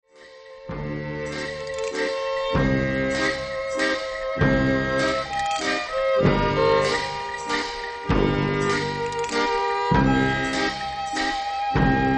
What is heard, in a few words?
Waltz For Strings And Tuba